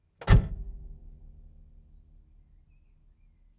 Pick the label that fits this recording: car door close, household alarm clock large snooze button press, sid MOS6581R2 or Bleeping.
car door close